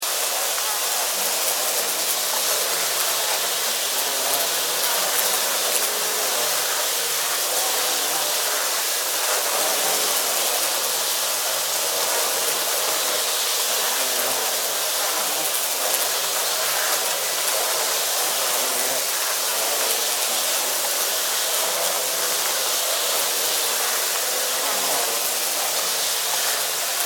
Flies, Animal, Flies, Infestation, Wings, Fly, Insects, Bugs; Heavy; Surround Sound